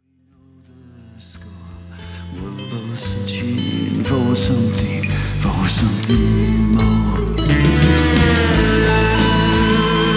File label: GTR SOLO